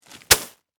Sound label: Bag Rocks Down, Foley, Plastic, Set; Pebbles; BlastWave